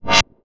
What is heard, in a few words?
dancemusic